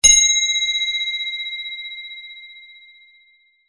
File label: crotale